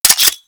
Grenade Launcher Mech; Warframe; Grineer

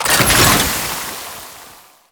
Alchemy Grenade Deposit Toxin; Entrati

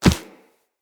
Run Dirt, Footsteps; Characters; Operator

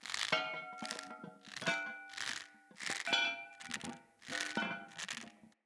Incense Burner Swing Loop

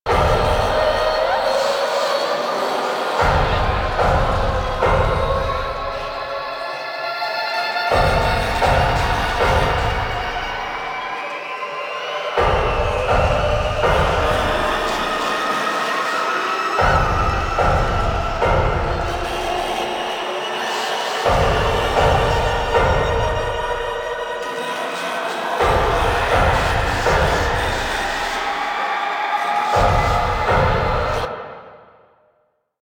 Tension Loop; Warframe; NineteenNinetyNine; Stage6TheCatCameBack

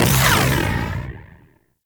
Ball Angry; Tenno; Warframe; Missions

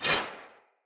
Dagger Melee Whoosh Layer; Weapons; Player; Swords

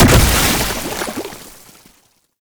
Whip Proc Explosion; melee; weapons; Oraxia

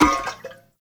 Mech Oil Grenade Bounce; Vehicles; Abilities; Warframe; Nechro Mech

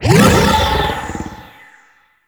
Dragon Ability Cast

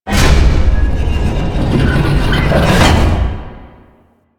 Portcullis Door Close; Warframe; Entrati

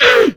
Pain, Female; Warframe; Grineer Female; Battle Barks

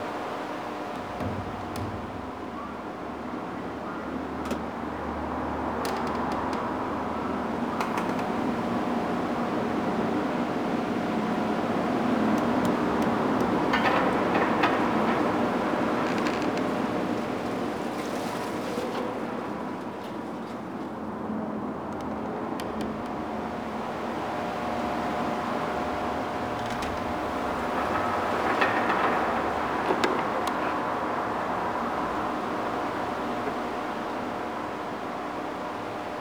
Tailor Shop Small Bed Loop; Nineteen Ninety Nine; Interior

